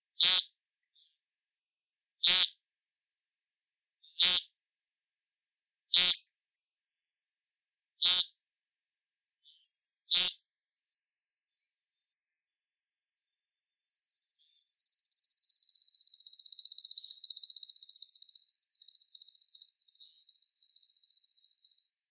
Becasse fort, becasse d'amerique

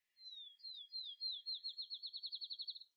Bruant des champs

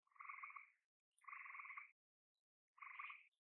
Rainette Versicolore, sons pas oiseaux

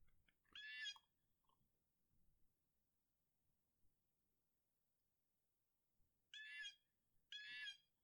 Typique, geai bleu

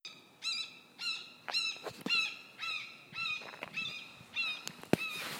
Buse a epaulettes

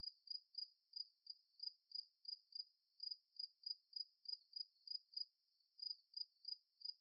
grillon, Quebec; sons pas oiseaux

